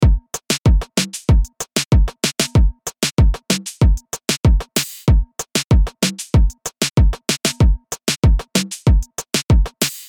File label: Reggaeton 95BPM